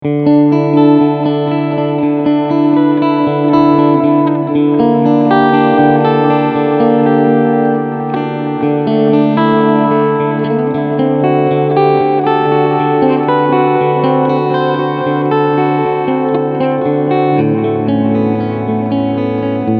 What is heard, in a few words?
Delay Clean